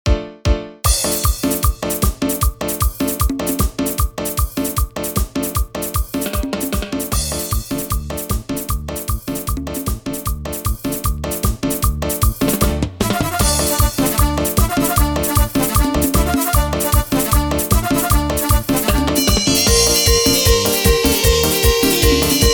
Intrumental Chichero

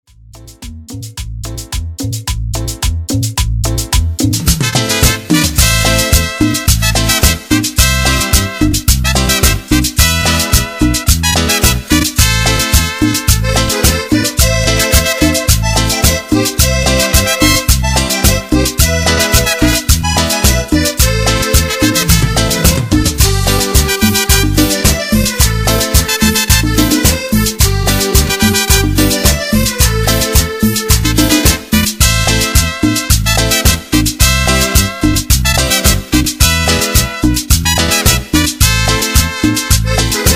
Cumbia Triste Intro Outro Tecnocumbia

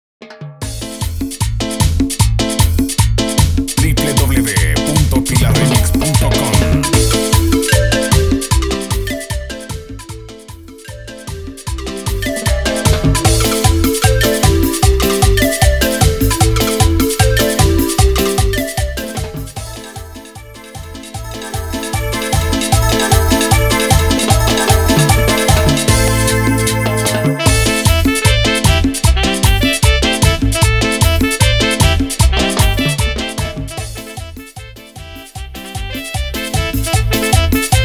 Instrumental Ec Bpm 152; Dimax Dj Mix

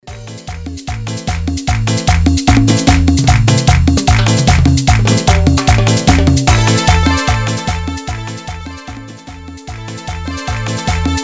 Instrumental Chichero Ec, Bpm 150; Dimax DJ Mix

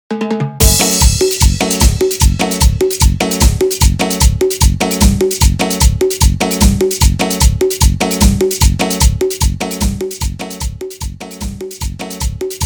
Intro Acapela Bpm 150

